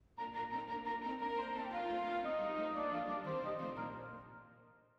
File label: ORCH REDUC piano; Beethoven; Symph3